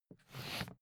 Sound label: briefcase plastic slide hard; industrie wirtschaft; bueroarbeit